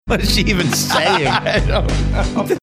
laughing saying what is she even saying

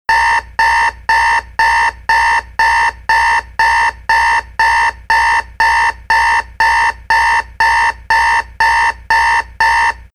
alarm clock sound 10 second loop

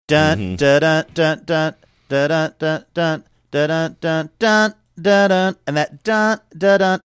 singing opening bars

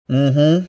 mmm hmm

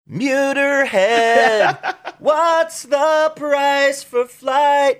singing what's price for flight

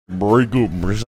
making distorted audio sounds

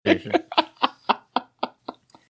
laughing

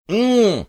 "mmm"

